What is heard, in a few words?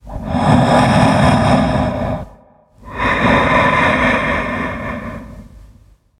Colossus Breathing Idle